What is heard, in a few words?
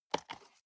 plastic box food small open soft